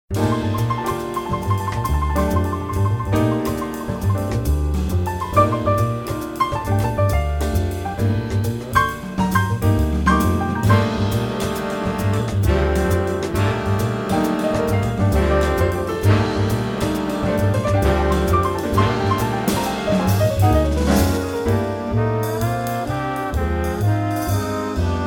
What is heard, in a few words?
Chorale; Adult Themes